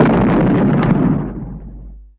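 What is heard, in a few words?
weapon ground small explosion; PC Gamer IT CD 47; USAF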